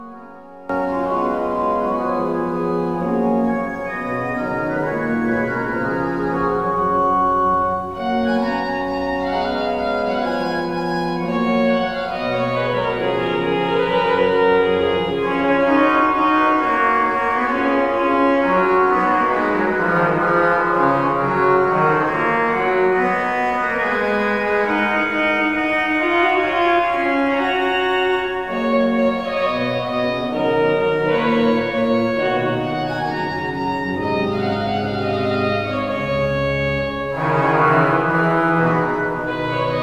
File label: couperin dialogue